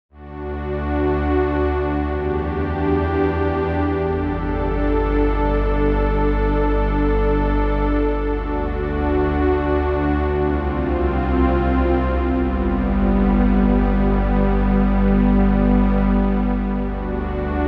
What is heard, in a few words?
siel strings